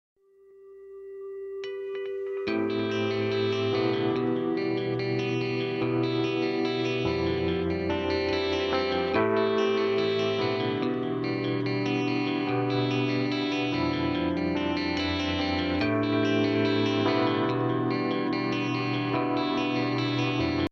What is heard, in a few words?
guitar